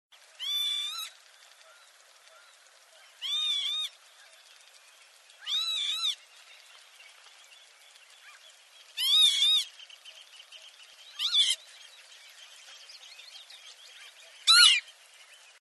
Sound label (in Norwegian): Vipe lyd